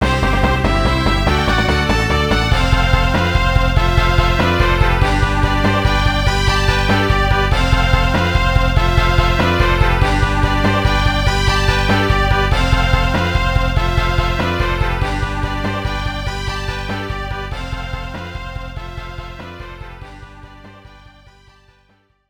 Win Match; mario tennis power tour enhanced version; gba

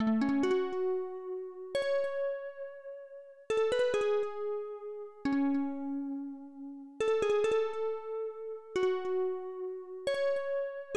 Horn BGM; Forgotten Myth; Xak III The Eternal Recurrence; PC-9801

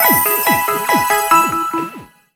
Jingle - Pokemon Healed; Pokemon Sword Beta

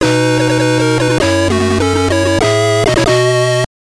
Jingle; puzzled 2001 gbc

